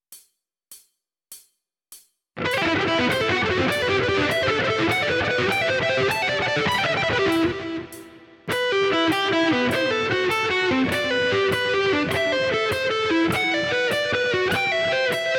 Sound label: Sweeping Lick